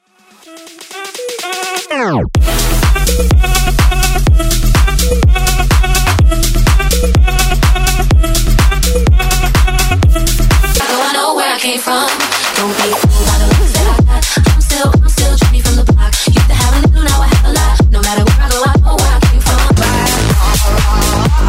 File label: Throwback Pop House Megamix